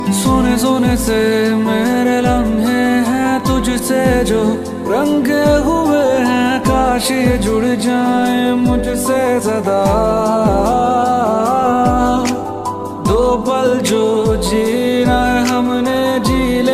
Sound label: Romantic Hindi Song